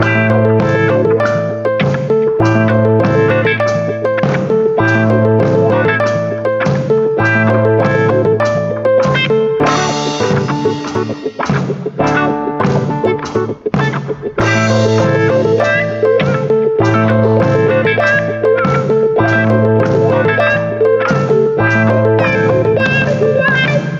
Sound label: Ultimate Funk